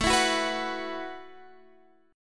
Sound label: B Suspended 4th A; Bsus4/A; strummed